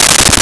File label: old auto, modern weapons; golgotha